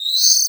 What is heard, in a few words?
LI L CHIRP, CARTOONY; Spectrasonic Distorted Reality 1